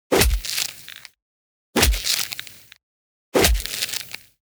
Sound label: body cutting impact